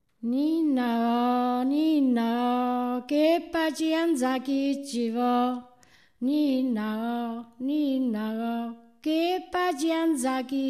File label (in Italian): Coro, Filastrocca; Amuset; Bomba